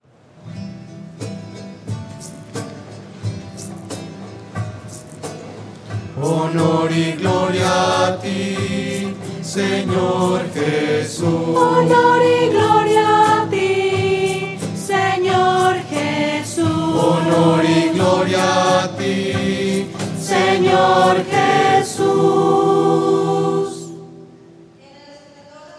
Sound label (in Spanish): voces; misa